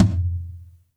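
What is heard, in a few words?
TOM soft; Zero-G; Chemical Beats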